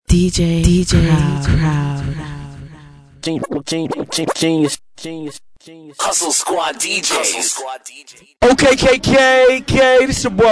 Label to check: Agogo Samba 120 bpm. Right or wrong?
Wrong